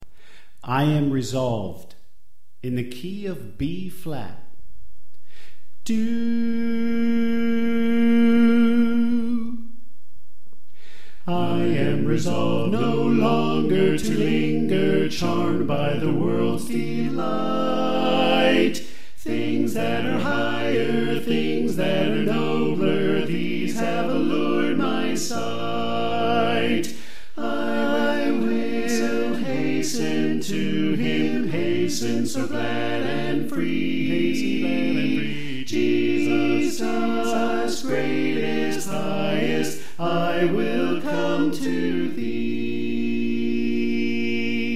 Bass Left; I Am Resolved

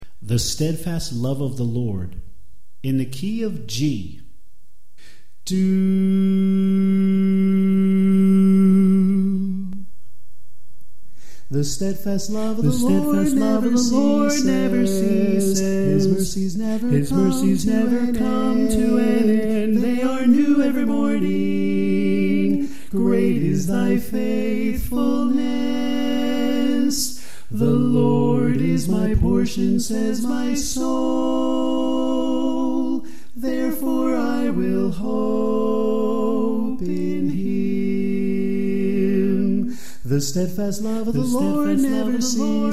Bass Left

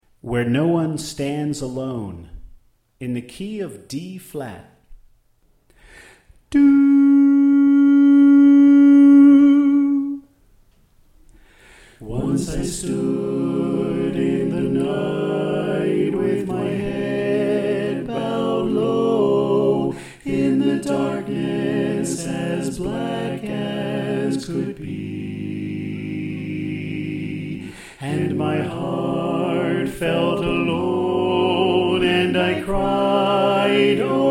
Soprano Left

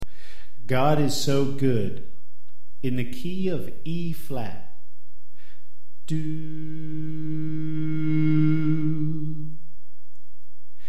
Soprano Left

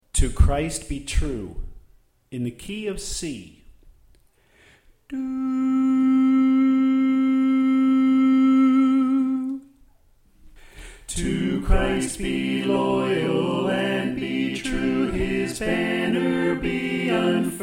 Tenor Left

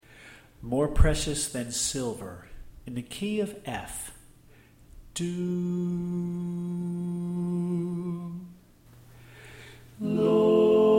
Alto Left

